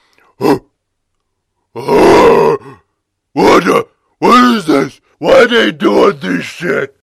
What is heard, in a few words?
death; freak fortress 2; bat metal